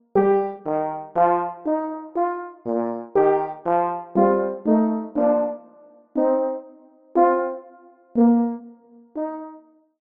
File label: French Horn Staccato; Aeternus Brass; Syntheway